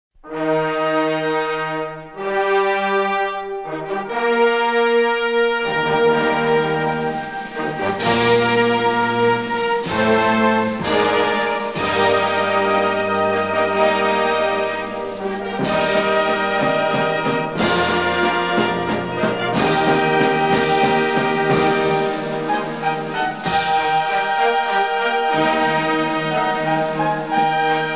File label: Fanfare